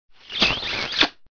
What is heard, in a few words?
Long friend kiss